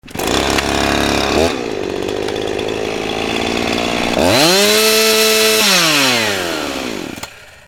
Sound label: husqvarna chainsaw; ringtone; chainsaw ringtones